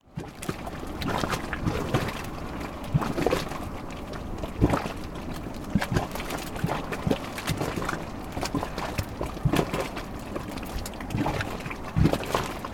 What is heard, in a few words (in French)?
Islande Marmite Námaskarð